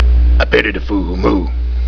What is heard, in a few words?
moo